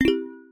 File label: glass change